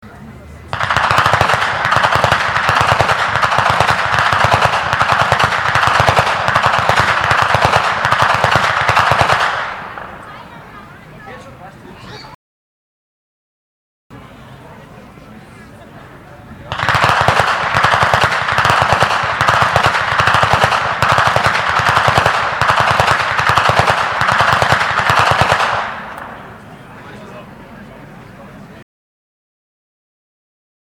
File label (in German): Schnalzen; Ainring; Ainringer